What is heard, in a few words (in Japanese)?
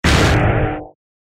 闇魔法 小; 魔法効果音; RPGなどに使える効果音です